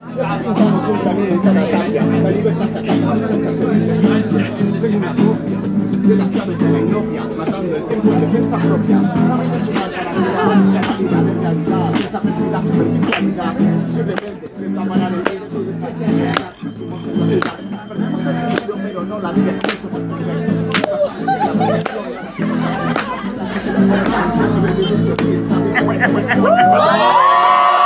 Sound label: Rap Off Morocco; study abroad